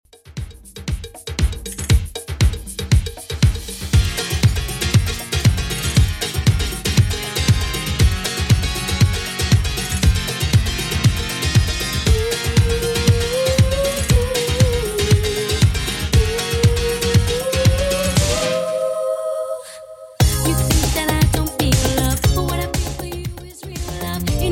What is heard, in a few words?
90s Redrum 118bpm